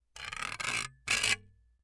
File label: Part Assembly; TOOLKIT vol.2